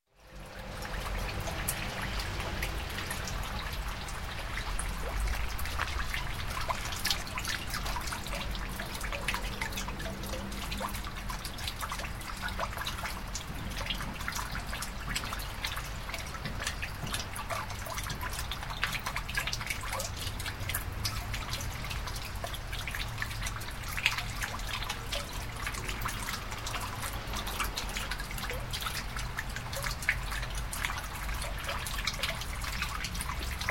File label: Water Sewer Dripping; Sound Ideas 2000 Series